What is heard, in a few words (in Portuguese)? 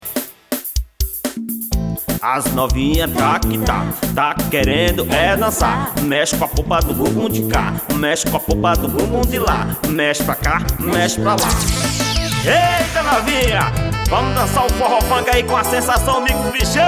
forro fank